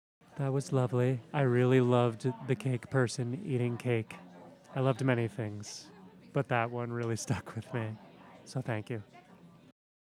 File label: Audience Recording; a-meal-premiere